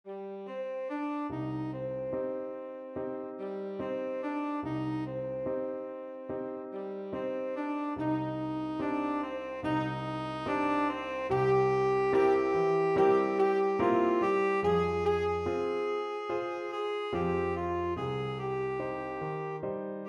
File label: tenor saxophone